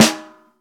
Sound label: roomy snare one shot a key, snare single hit tuned to the A note